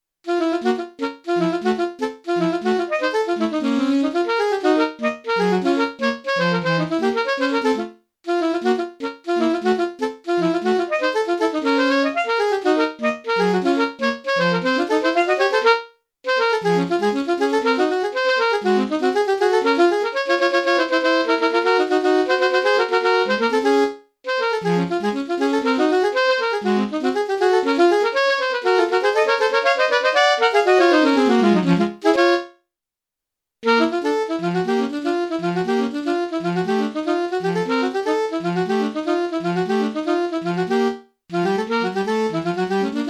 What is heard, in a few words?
Alto Sax, STANDARDS ALTO SAX DUETS; Here are full length demos that are PANNED L/R, so you can play along with them!